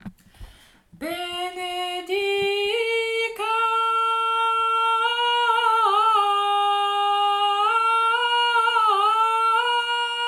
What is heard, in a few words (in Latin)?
Benedicamus melodie